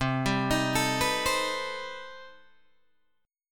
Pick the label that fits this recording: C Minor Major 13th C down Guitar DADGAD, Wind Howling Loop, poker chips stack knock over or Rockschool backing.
C Minor Major 13th C down Guitar DADGAD